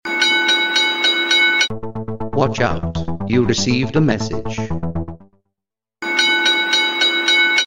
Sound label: Message; Watch Out